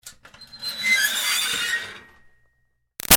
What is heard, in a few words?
Screech Metal Iron Board Slow Start; Ironing Board; Creepy; Materials; Big Room Sound